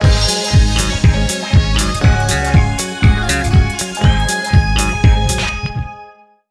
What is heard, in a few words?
Match Win; Disco Kid